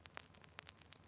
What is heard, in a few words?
crackles, Vinyl